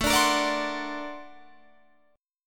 Bb Augmented Major 9th A

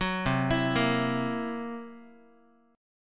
C 7th Flat 5th C down Guitar; C7b5 arpeggiated; 9,8,7,8,x,x

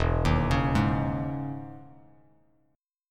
E Major 13th down Bass Standard

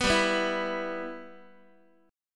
G 6th, Guitar; G6 strummed

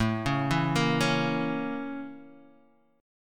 A sharp Minor Major 7th Flat 5th A

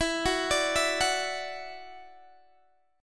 E 7th Suspended 2nd, down, Guitar, DADGAD; E7sus2; arpeggiated

